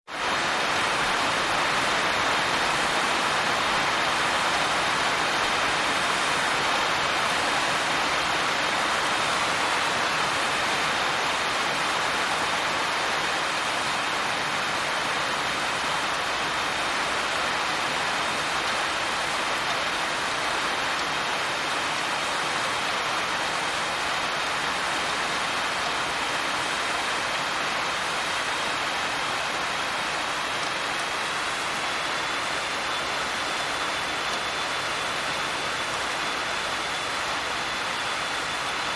Deluge On Tin Roof

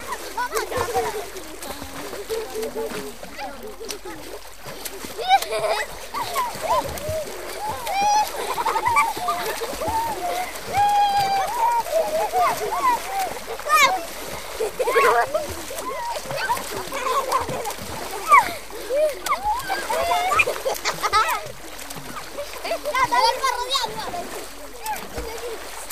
Kids Venezuelan Laug

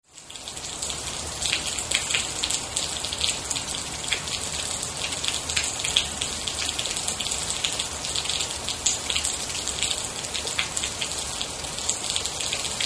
Ominous Alley Rain, city rain

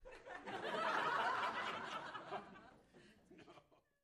Crowd Short Burst, small int

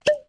Cork Pops & Squeaks; Pops

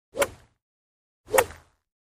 Golf Practice Swing

